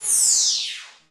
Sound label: Title Transition Laser Short; Production Element